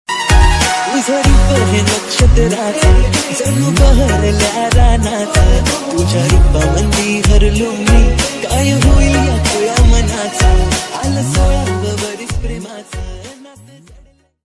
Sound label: marathi ringtone